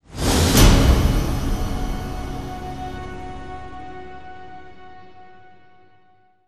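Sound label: sting, full percussion; transformers war for cybertron gamerip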